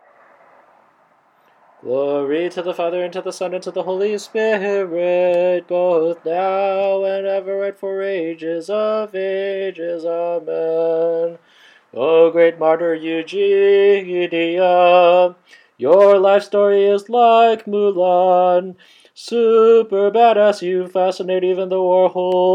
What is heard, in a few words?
Tone 4